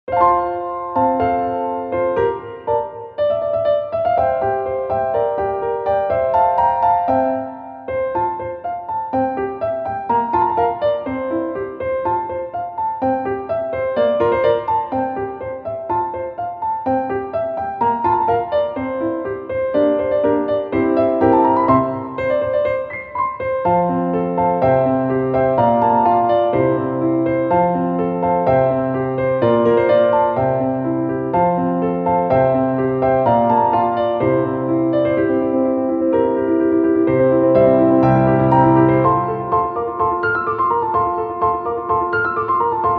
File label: piano